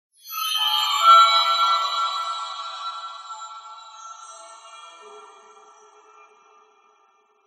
whistles pattern metallic shrill general descend tail off reverb magic cold; Skyclad Sound